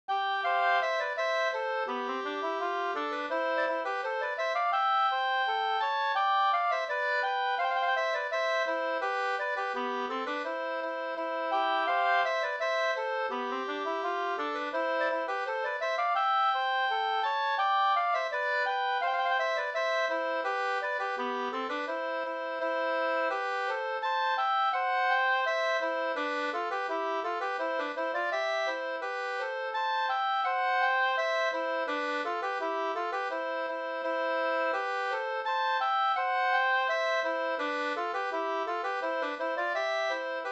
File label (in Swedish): brudmarsch